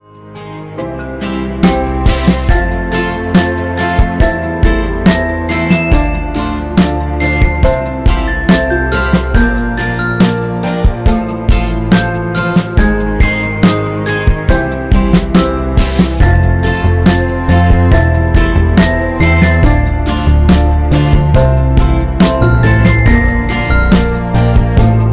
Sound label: romantic